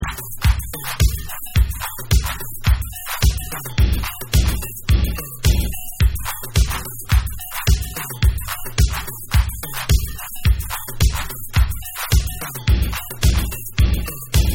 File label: Bass Dub